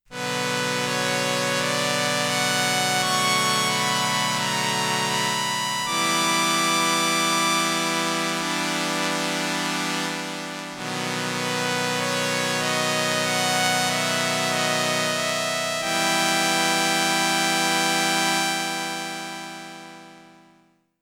STRINGS II with slow attack & sustain, Roland RS-202